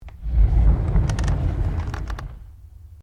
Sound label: Drawer Interior Wooden Bathroom Large Full Open; Various domestic drawers; Household